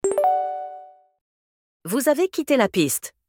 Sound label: leave track sound fr FR